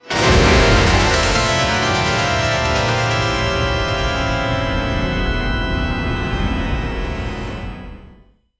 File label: grand jackpot finale, victory